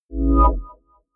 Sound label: chord swell very short